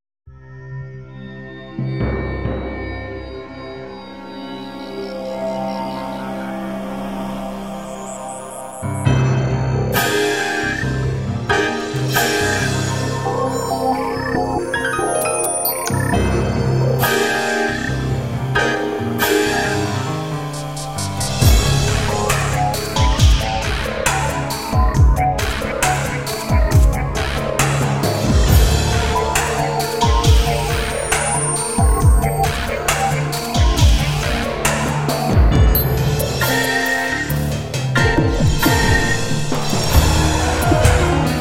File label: Roland FANTOMS